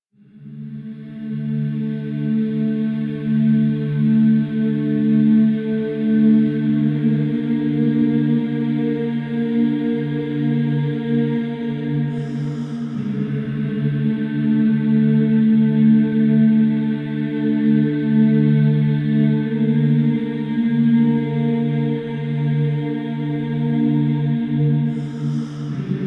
Hub Amb Cue, Music; Warframe; DE; Dynamic Music; Entrati

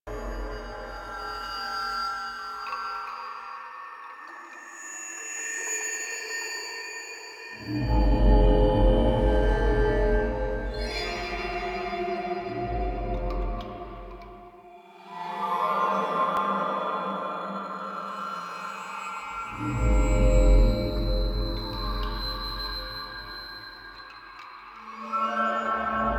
Exterior Hub Loop, Ambience; Warframe; Infested Eris

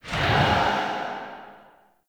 Dodge Whoosh; Characters; Enemy; Abilities; Circade

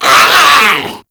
Infested Runner Attack Call; Warframe; Runners

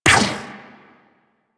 Drone Laser Fire; Artificer Drone; Super Moa Drone

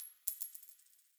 Coin Drop

